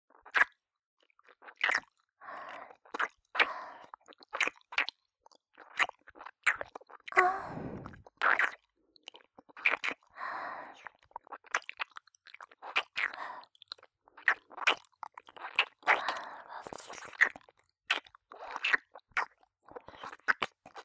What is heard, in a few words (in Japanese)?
キス音両耳ループ用 (ループセリフなし)